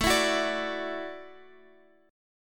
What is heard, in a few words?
E 7th Suspended 2nd Suspended 4th, A